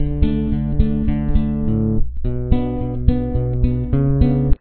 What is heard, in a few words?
chorus, guitar